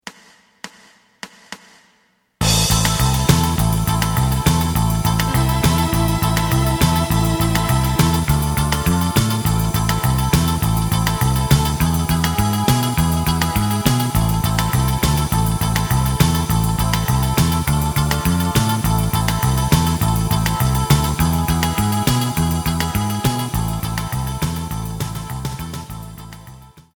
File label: lead